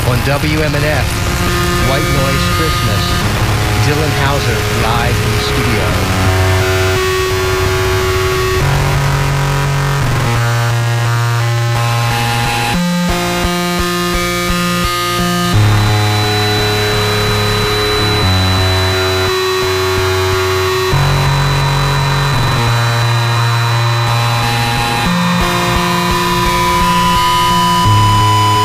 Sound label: live on white noise christmas